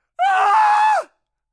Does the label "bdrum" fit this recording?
No